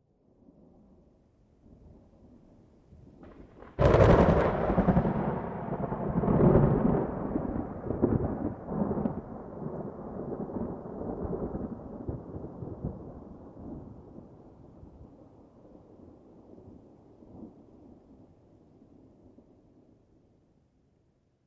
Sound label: Lightning Close